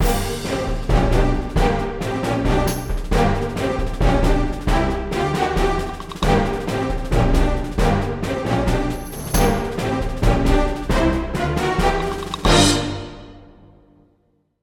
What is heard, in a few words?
Breaking News